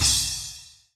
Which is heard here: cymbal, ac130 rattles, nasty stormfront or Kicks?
cymbal